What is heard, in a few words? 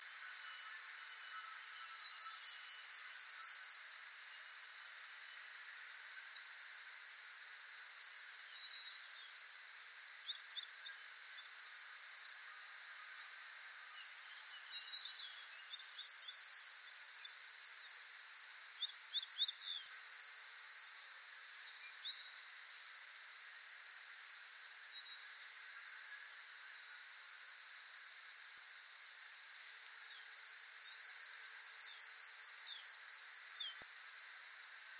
teist black guillemot mandtii